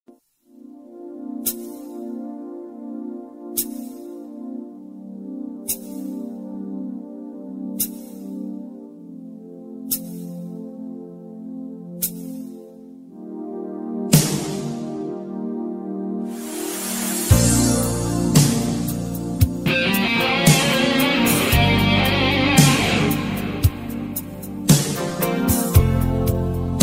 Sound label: Instrumental